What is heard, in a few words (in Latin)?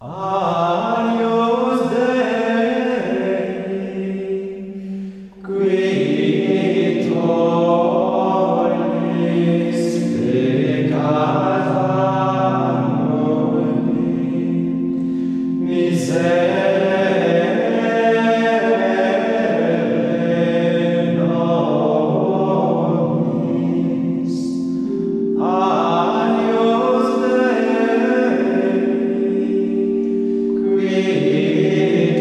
Schola Agnus Dei VII; Schola Bellarmina; France